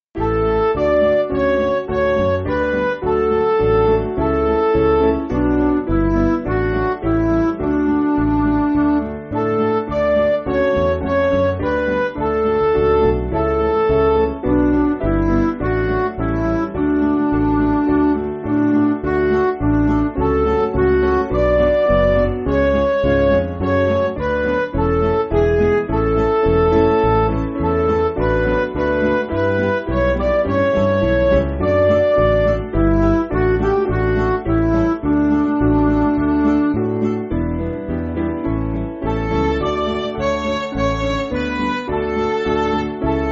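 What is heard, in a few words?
Music Backing Files for Churches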